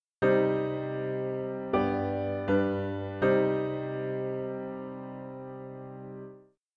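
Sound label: piano, dictation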